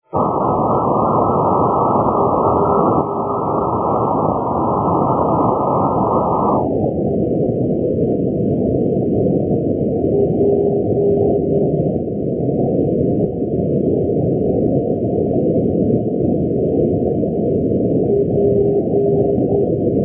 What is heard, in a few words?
ndb pardubice; p-888; sumperk; europe